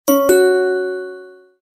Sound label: usb connect